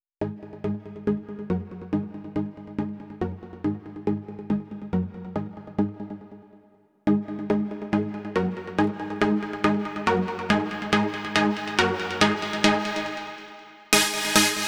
Synth Lead, 140 BPM; Vengeance Samples; Song Kits; Rap; U Got Me Rocking

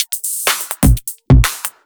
Electro Loop 128BPM; Vengeance Samples

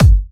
Soft Kicks; Vengeance Samples

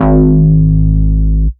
Bass, Root G#; Vengeance Samples

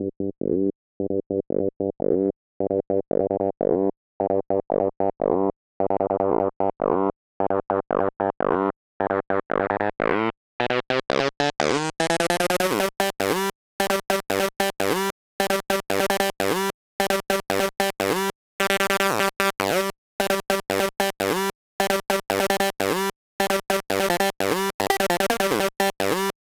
Tune Up G; Vengeance Samples

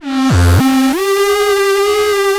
Roland D Line, Roland S100; musicradar-synth-samples

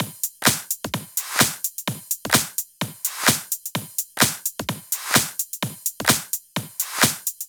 128BPM Wobble Drums; Vengeance Samples; Wobble Kit

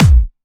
Beast Kit 140BPM Kick 4 4; D minor; Drums & Perc; Vengeance Samples

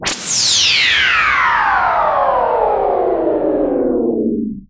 FX Sweep Down; Vengeance Samples

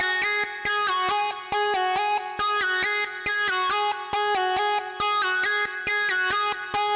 Synthline WET 138BPM, G# minor; Bassline & Melody; Vengeance Samples; VTS1 Zodiac Kit

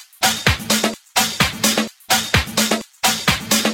House loops 128BPM; Vengeance Samples

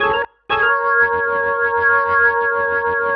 organ F#, vocal house; PS-28 Vocal House Samples; organs

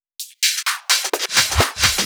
Fill 128 BPM; Vengeance Samples; VEH1 Loops

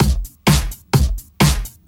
Housebeats, 128BPM; Vengeance Samples; VEH2 Loops

